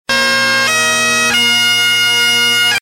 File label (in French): cornemuse; Quiz